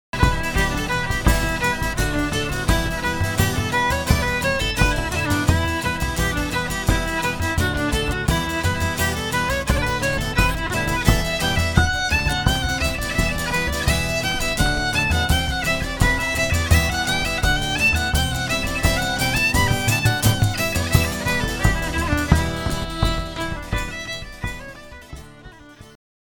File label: REELS; The Man of the House